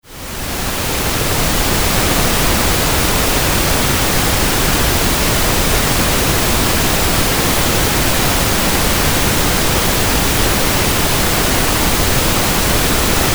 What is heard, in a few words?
pink noise